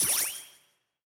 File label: Flying Get Score